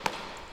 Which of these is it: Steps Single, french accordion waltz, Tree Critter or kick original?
Steps Single